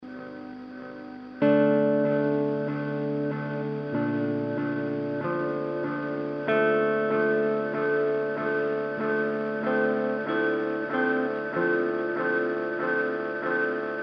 Microwarmosc

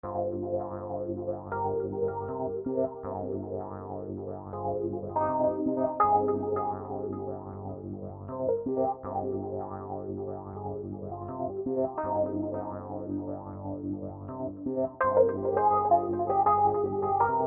FX Synchronize

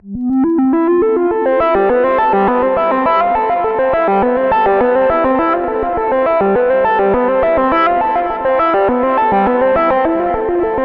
Distorted Lead Guitar; Gforce Oddity 3

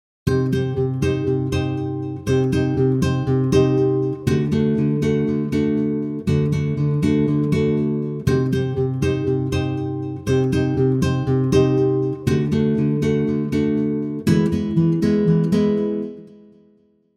Scoring Acoustic Guitars Rhythmic Harmony

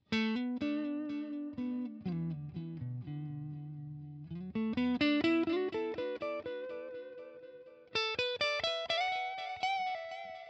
Boss Nextone 6V6 CLEAN dly

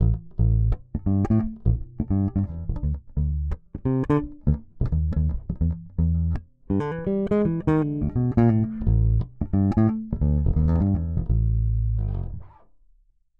Ibanez EHB1006MS Finger Neck Passive

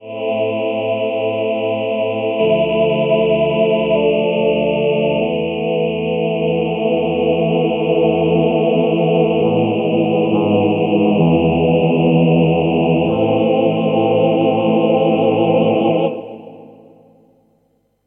FM Lullaby

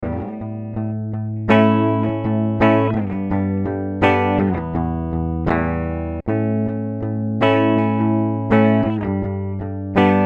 Boss EQ 200 Mid Scoop Clean

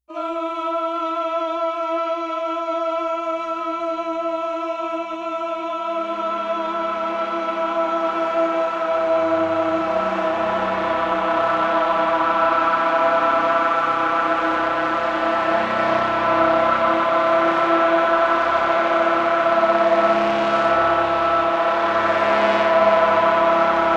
Eventide H910 Mellotron Chor Anti Feedback Drone

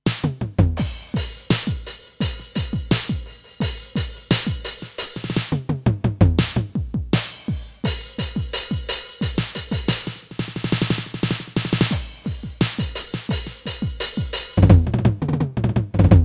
Alesis DM Dock Test 1980´s Kit

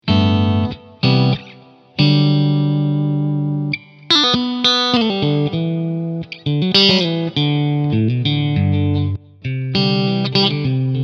Clean ECC83

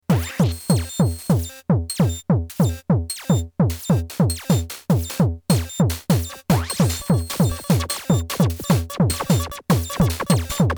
6 Voice Drums Delay; Dreadbox Telepathy Bundle

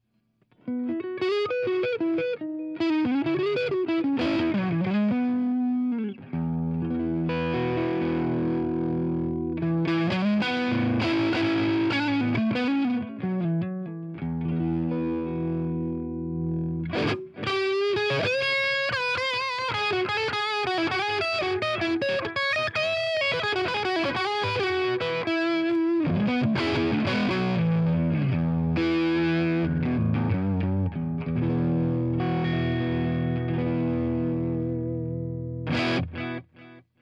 Line 6 Catalyst Dynamic SM57

